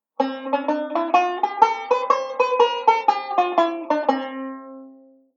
Bebop Scale; Beyond Chord Melody Volume II

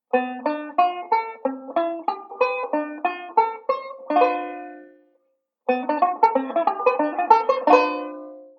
Passing Pattern, chord melody; Beyond Chord Melody Volume II

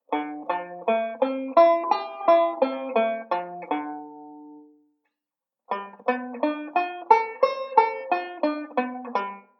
ii V Arpeggios, banjo; Beyond Chord Melody Volume II

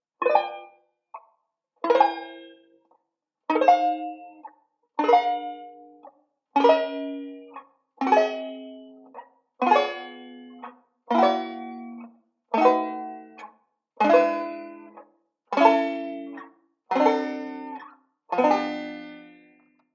banjo; beyond chord melody volume ii

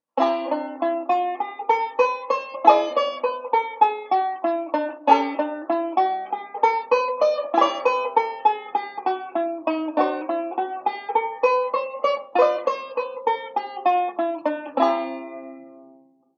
5 2 Scales, banjo; Beyond Chord Melody Volume II

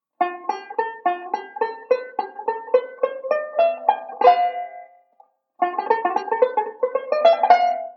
ii V Pattern, banjo

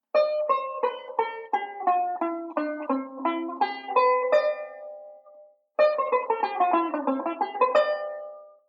Modal Sequence; Beyond Chord Melody Volume II

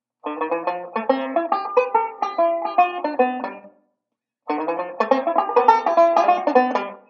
ii V Pattern, banjo

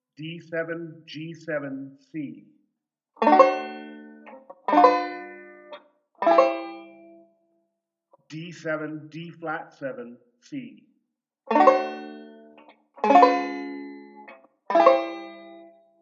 Tritone Substitution, banjo; Beyond Chord Melody Volume II

